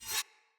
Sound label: Dagger Whoosh Accent; Weapons; Player; Swords